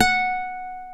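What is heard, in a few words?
F#4 FLAMENCO, FLAMENCO guitar; WorldSounds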